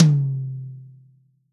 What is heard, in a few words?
TOM 16, real drum kit; HIPHOP KIT